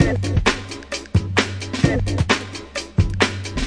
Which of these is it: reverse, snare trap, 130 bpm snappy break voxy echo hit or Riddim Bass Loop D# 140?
130 bpm snappy break voxy echo hit